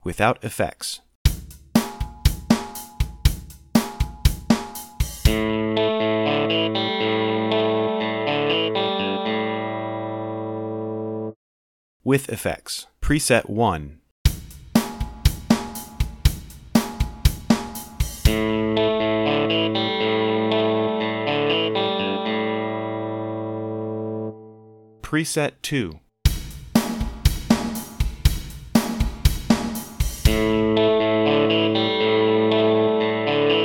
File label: reverb; Mixcraft; acoustica